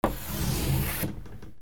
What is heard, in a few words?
FRICTION